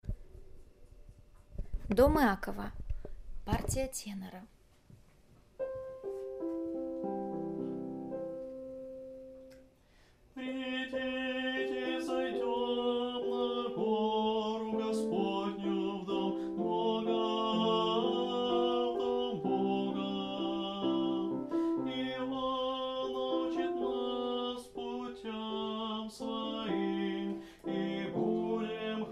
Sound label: tenor; epiphany; O dom Iakova